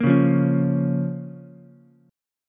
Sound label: A sharp Minor, Ukulele; strummed; JGuitar